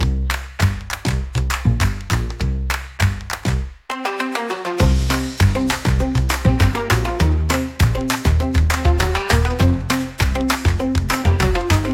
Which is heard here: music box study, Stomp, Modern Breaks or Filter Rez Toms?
Stomp